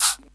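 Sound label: SLA HH, hi-hat; USB Soundscan vol.31; Miro s Grooves; AKAI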